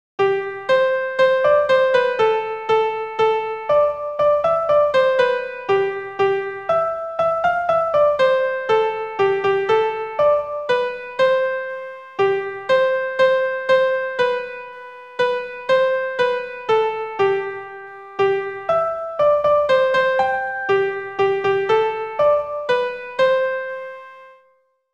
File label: We Wish You A Jazzy X-Mas - melody only